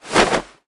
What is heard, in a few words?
roll; rozaic